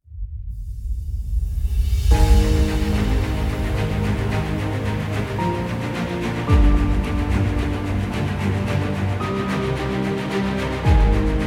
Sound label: Heroic mood